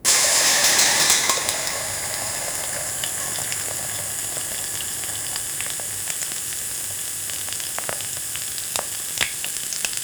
sizzling food cooking; food preparation

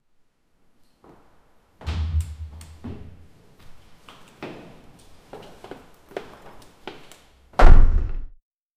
from the upper floor firs, room

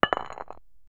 a solid heafty sharp quic

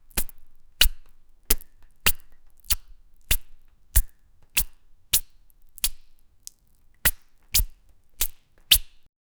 soft rhythmic, intimate

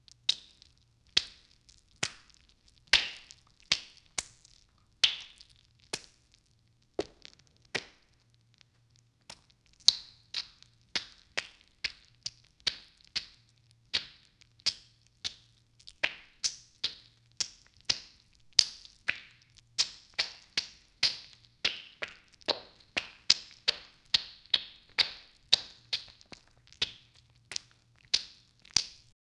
wet flesh slaps, creatures

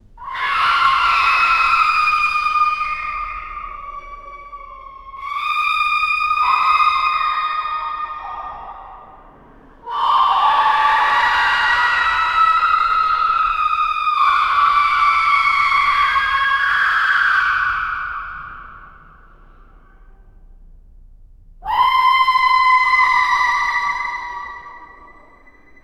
screaming mental patients; asylum

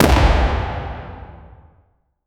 simple game cannon shot h

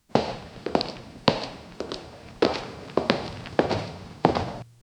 man walking away slowly s, footsteps

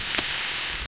the sound of a fish, food

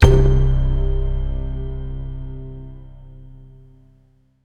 cinematic trailer piano; film

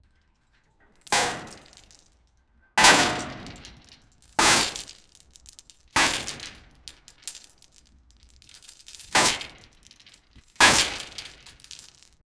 the clanking of heavy, industrial

